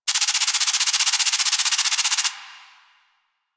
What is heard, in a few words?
UI End Of Match XP Count; DE; Warframe